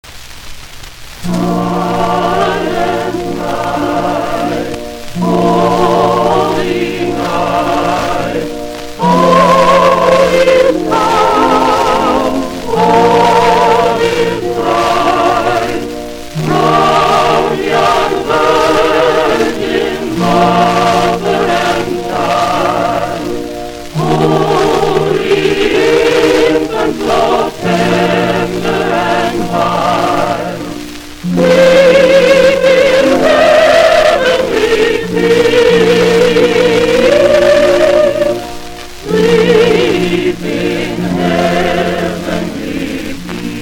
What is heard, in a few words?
Silent Night Chorus